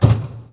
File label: Drop, INDUSTRIAL